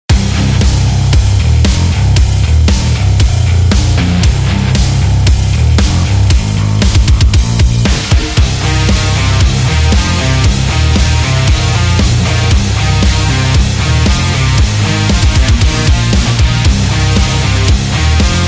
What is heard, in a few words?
This Hard Rock